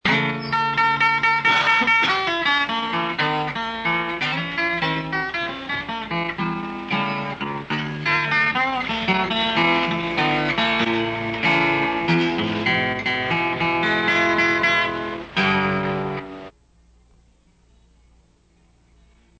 Tuning

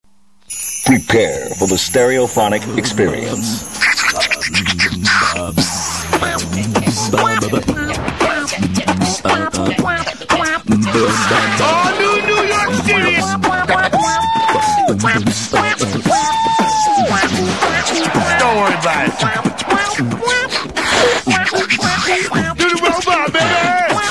Beat Box

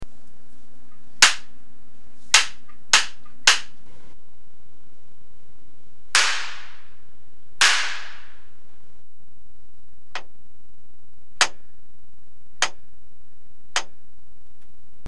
reverb

